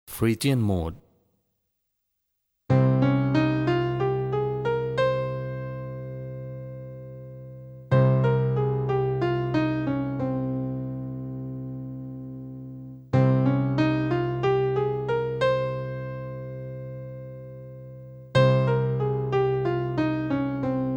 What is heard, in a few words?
phrygian